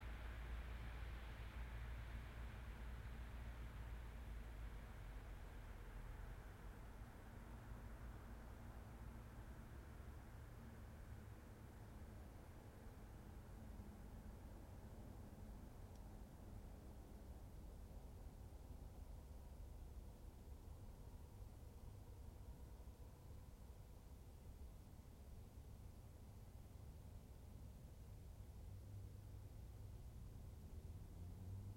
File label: yamanakako sound